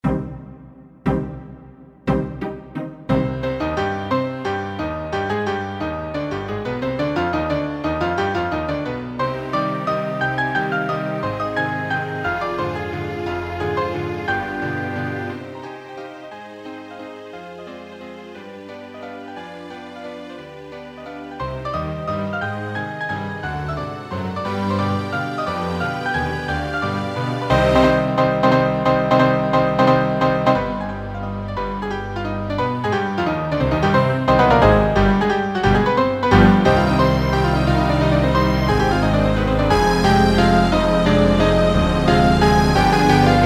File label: practice tempo accompaniment 59 bpm